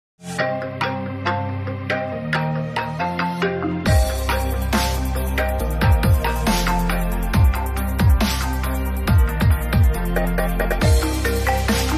iPhone Xylophone Remix

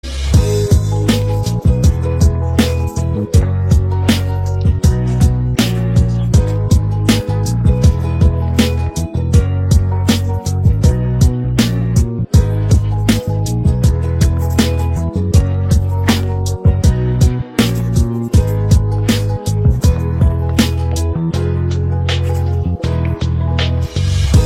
Instrumental

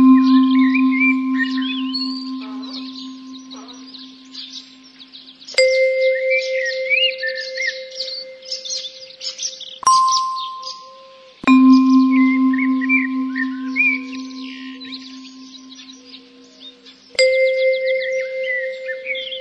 SMS Glocken

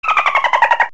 Dolphin

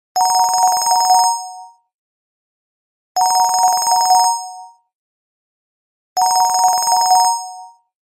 Telegraph

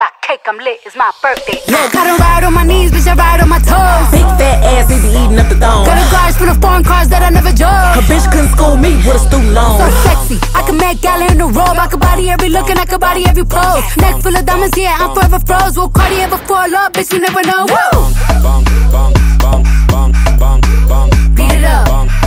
Bongos

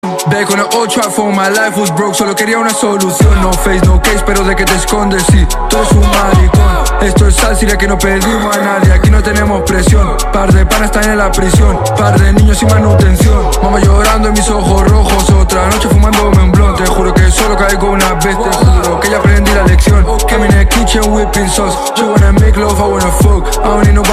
Trap Phone

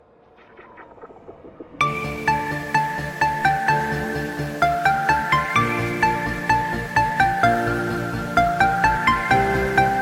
Awesome Classic Bells

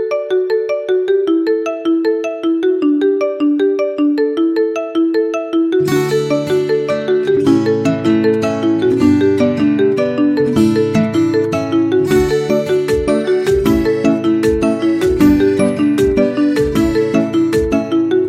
Mi Note 10, tono de llamada

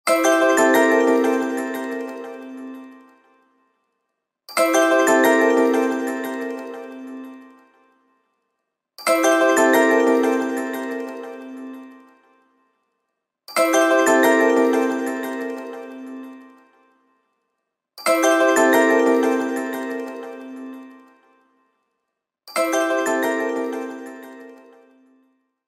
htc sense

